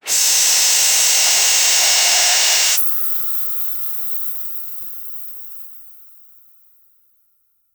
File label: high pressure squirt blas